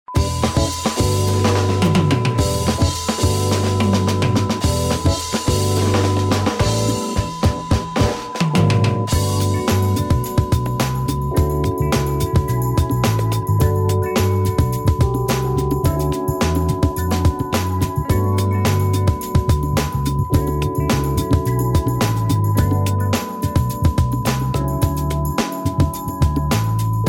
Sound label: smooth melody pop